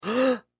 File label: Human Male Gasp; Gasping; Voice; SFX Bible